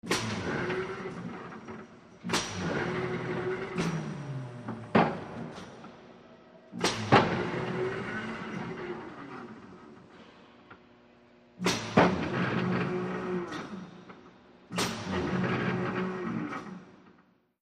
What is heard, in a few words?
Crane Motor Movement